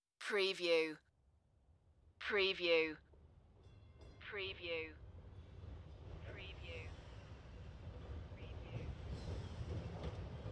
Trolley Approach Stop

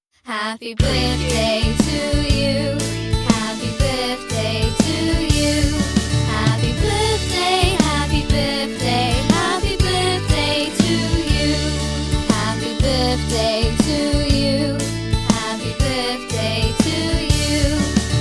happy birthday to you children vocals

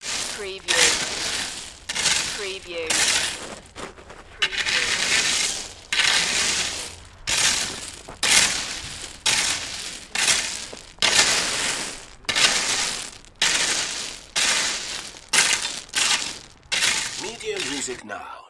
Raking Gravel

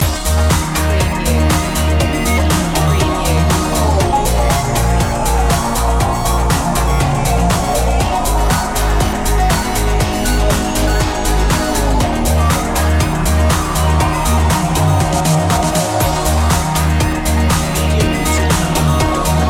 soft techno pulse

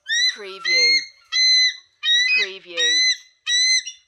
American Bald Eagle squawks several times

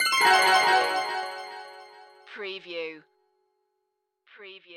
Magic Chime Spooky

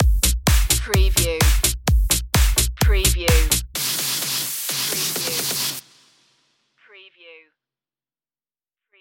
club dutch stinger

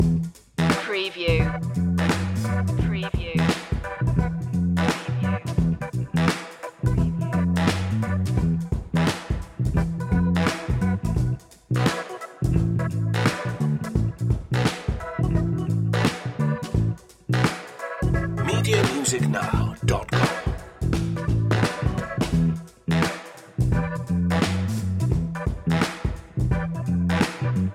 cashmere deluxe loop

